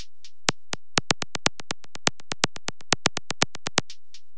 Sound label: zapper; Music Store Sound Service; Einstein